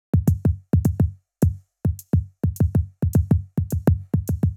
105 Loose Grooves, Percussion

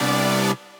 Future Bass Synths Pitched

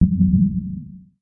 One Shot, Hits; One Shots; Touch Loops; ElementsOne; Abstract Rhythms

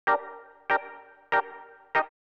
ORGAN 96 G; OLDSCHOOL; Keys

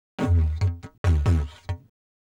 ETHNO 125 A

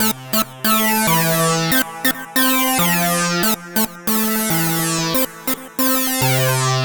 TECHNO SYNTH 140; Keys

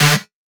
synth shot D; Electro; Anthemic; synth shots

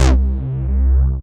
BASS MONO

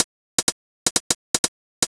HOUSE HIHAT 125; Beats

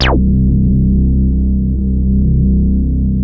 TOUCH HI C2, OBMX BASSES

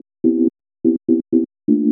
house synth 125 A, keys

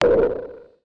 FX SHOT TEKNO 140; Techno2; Singleshots

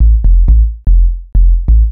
HOUSE BASS 125 A

